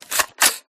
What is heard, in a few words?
hk416 switch, guns